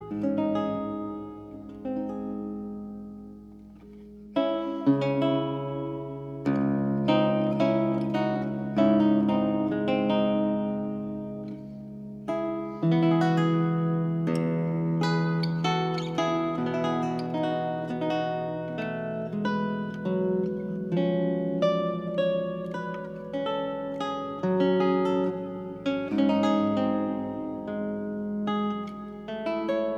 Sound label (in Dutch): gitaar